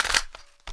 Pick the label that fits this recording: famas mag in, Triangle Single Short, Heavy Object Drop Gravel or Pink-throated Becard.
famas mag in